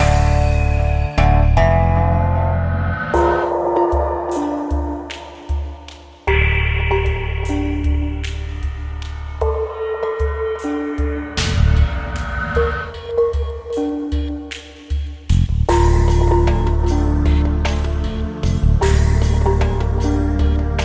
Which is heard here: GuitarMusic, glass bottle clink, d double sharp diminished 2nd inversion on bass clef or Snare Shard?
GuitarMusic